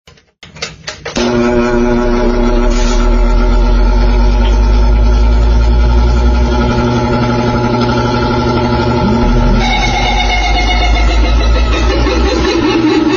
ventilador

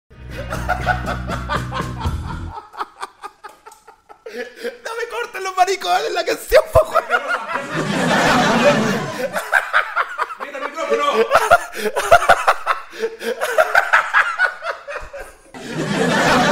ataque risa

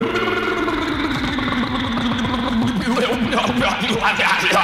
brrrrrrrr sonido beso

